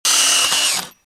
construction machine drill jingly

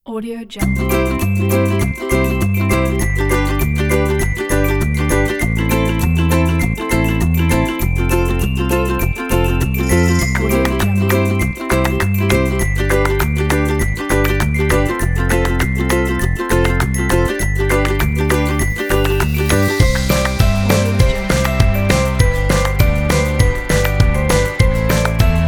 kids music

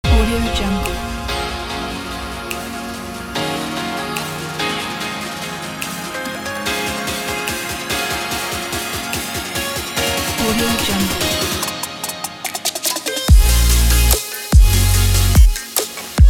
fresh summer future bass

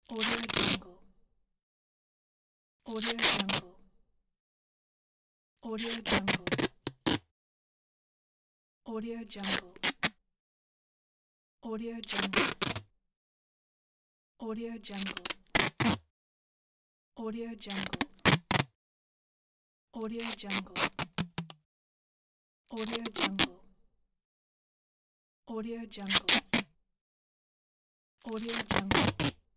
scotch tape

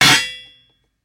comic metal; prosoundfx

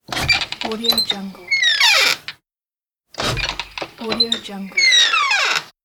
old creaky door open

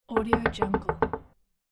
knock on door

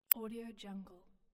button click

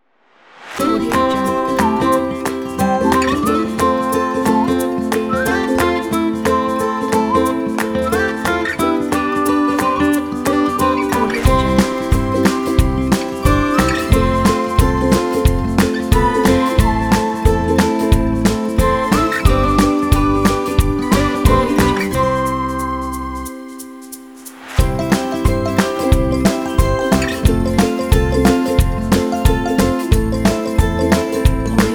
to be happy kit

